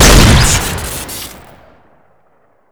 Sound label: long shotgun shoot crit; tf2classic